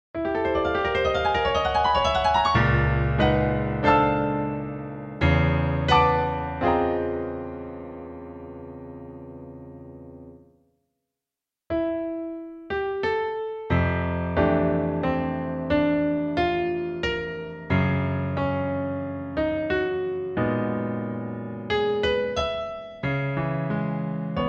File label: piano